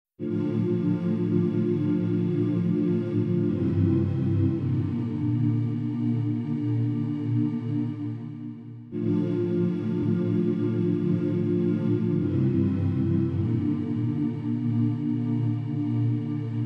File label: Gospeljuice